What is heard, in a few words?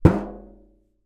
tin empty hit; foley; zapsplat